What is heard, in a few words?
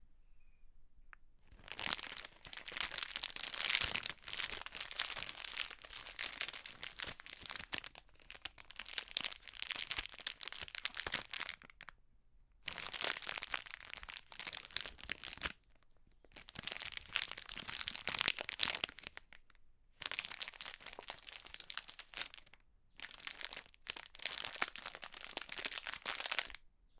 Candy Paper Wrap Crackling